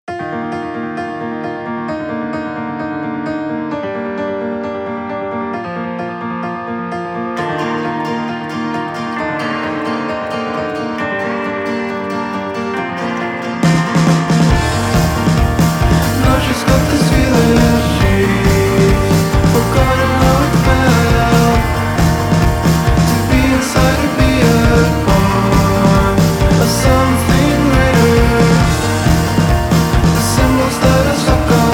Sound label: marching band